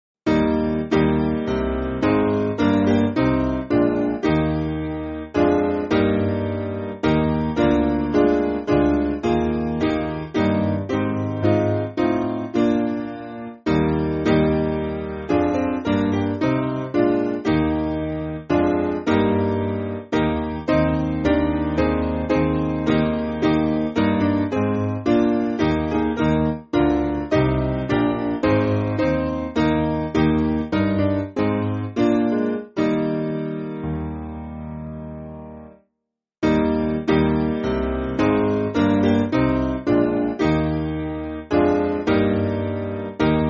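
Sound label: Piano, Music Backing Files for Churches; Torquay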